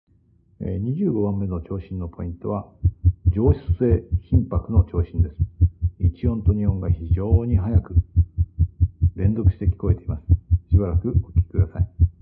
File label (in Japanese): SVT 上室性頻拍